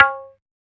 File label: Perc, Dubstep One-Shot Drums